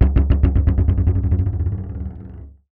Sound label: Marbles & Framedrum; musicradar; contact mic madness; Raw